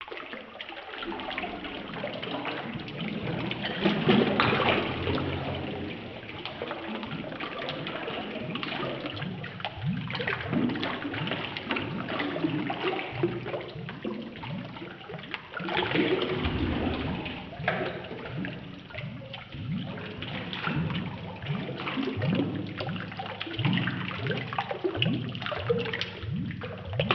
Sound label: bad water noises